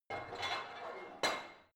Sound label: barbell heavy plate put on hard, fitnessstudio